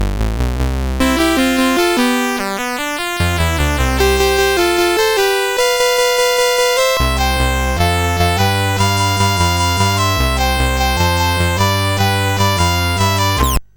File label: La Bamba, CSG8580R5; Suicidal Software